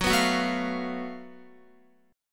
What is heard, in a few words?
Gb Minor Major 7th Double Flat 5th, Guitar, chord; strummed; x,9,9,11,9,12; JGuitar